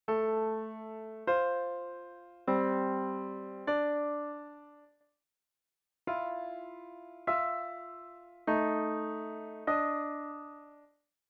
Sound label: consonance dissonance